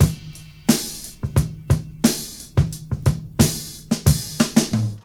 89 bpm drum beat g key

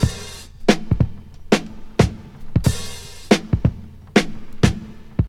91 bpm drum loop e key

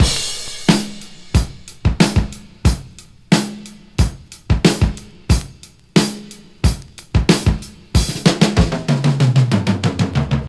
92 bpm drum beat e key

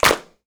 clap d sharp key; percussion; single hits one shots

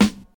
00s dope steel snare drum g sharp key

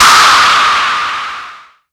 crash cymbal drum sound d key; Duration 0:02; Samplerate 44100 Hz; Bitdepth 24; one shot

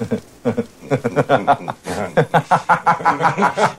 funny multiple laugh

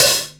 clear pedal hi hat single hit c key; tuned drums

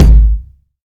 big kick sound g sharp key

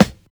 deep acoustic snare sound g key; snare drum samples

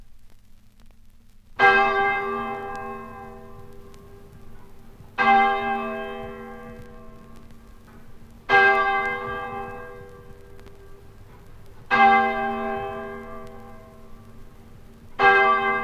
church bell tolling

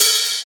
soft open hi hat c sharp key

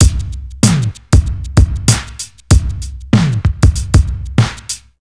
96 bpm drum beat c key

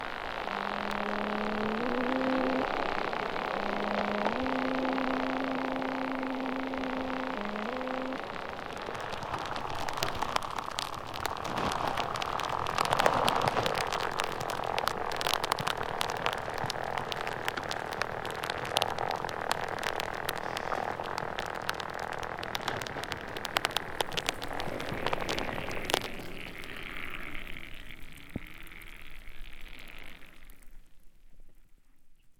hydrophone recording of burning embers underwater; soundscapes

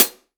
treble heavy high hat sample f sharp key; hat samples

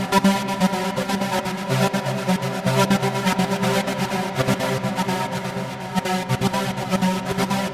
trancy acid house pad 124 Cm; instruments; loops

